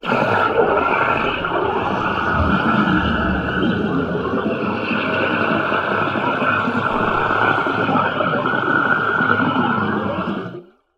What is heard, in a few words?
industrial air cannister spray release underwater; zapsplat